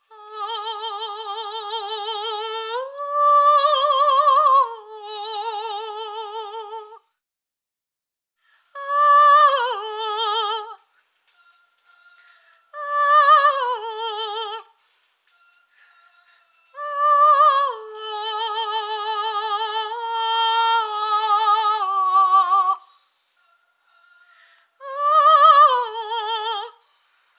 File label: Orc March Choir